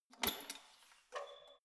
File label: letterbox metal unlock open pull